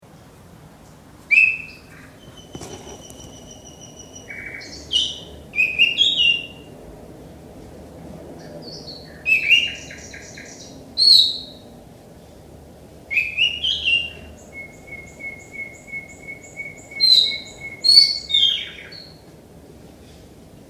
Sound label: Nightingale Duet